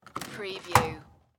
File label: Dryer Door Open; Sound Effects Bible